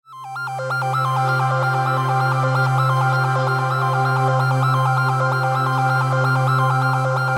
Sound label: Infographic call ringing tone loop